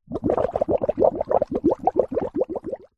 nature water bubbles bubbling in foamy soapy water